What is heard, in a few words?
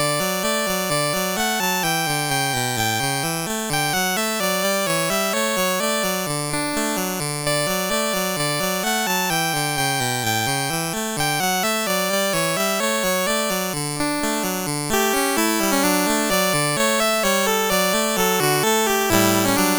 Adagio, MOS6581R4; Joseph A Grau; Les Petits Riens